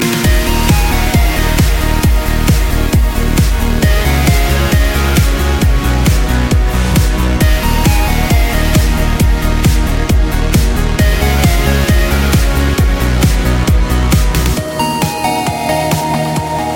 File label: trance ringtone